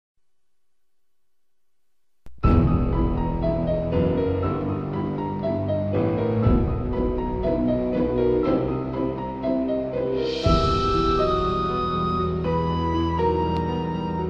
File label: Strangely Familiar Ring Tone